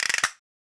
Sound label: Castanets; Sonic Foundry (Sony Creative Software); Joe Vitale Latin Percussion